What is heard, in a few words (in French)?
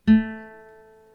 guitare 220Hz; Variation de timbre entre deux instruments à cordes; LA